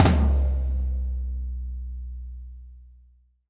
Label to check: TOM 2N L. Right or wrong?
Right